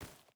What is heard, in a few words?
footstep barefoot forest walk; Rust